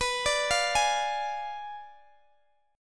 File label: B Diminished 7th B Guitar Open D